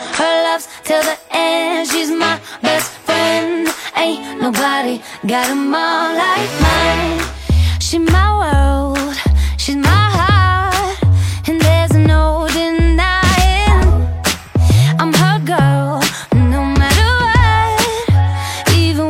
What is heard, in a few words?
mom ringtone